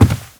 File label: foot carpet, footsteps